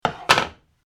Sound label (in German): brett ablegen